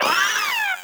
Maggot Bark; Warframe; Proto Infested

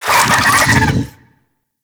Infested Big Ashen Vocal Attack Death; Warframe

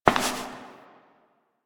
Step Perforated Metal; Warframe; Koumei

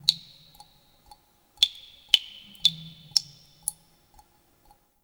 Terrarium Drips; Warframe; Ambience; Entrati

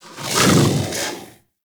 Kubrow Armored Vocal Breath Walk; Warframe

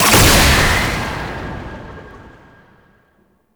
Incarnon Wrist Gun Alt Shot, Weapons; Warframe; Tenno

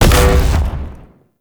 Void Dragon Beam Shot; Enemies; Abilities; Warframe; Duviri

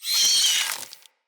root spider vocal death; Animals; Spiders; Vocals

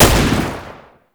Gunbrella Shot; Weapons; Warframe; Tenno; Koumei

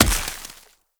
Snow Ball Impact; Warframe

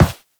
Operator Run Metal, Footsteps; Warframe

